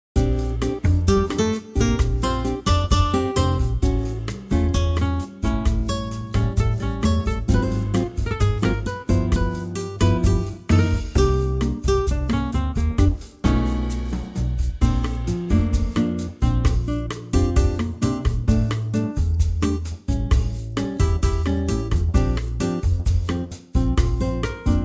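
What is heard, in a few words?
Bb, Backing Tracks for Male Vocals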